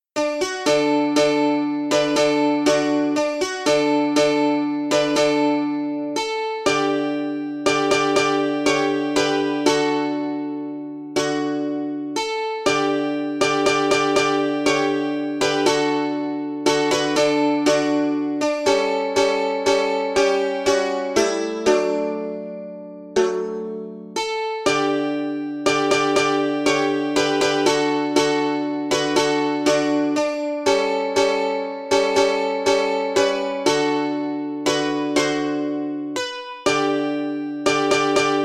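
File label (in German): balalaika und domra; Ethno World 6 Complete von Marcel Barsotti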